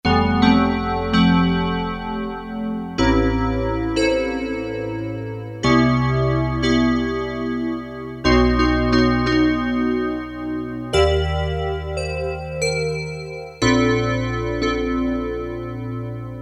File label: PPG, Korg DSS-1